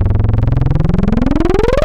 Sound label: Acid, Analog; Xfer Records Serum; Serum Presets